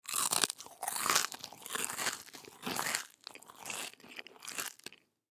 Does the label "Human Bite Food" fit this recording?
Yes